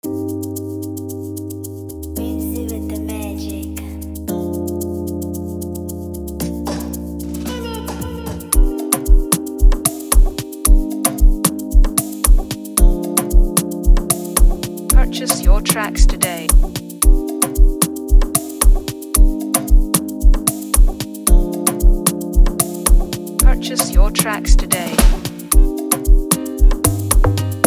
Afrobeat Instrumental

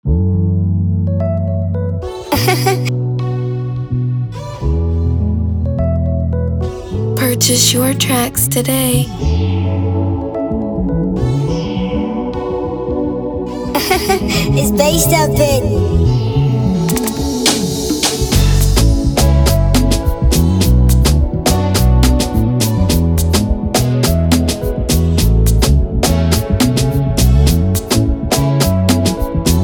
105bpm A major, afrobeat instrumental

